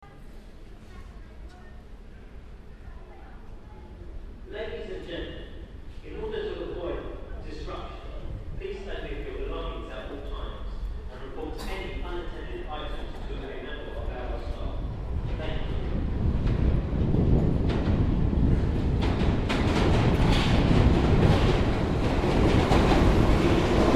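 binaural recording at the London Underground New Monument Station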